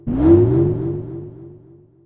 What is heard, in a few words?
fly